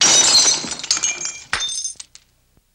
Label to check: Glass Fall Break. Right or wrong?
Right